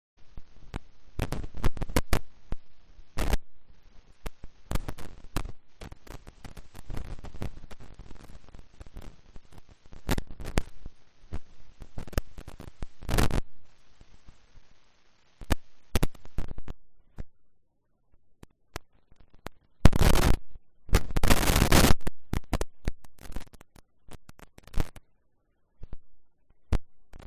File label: riverside; Kyoto U; Ashiu